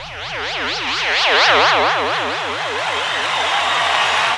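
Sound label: SYN right, Production Effects; Best Service - XXL Production Effects; SYN-FX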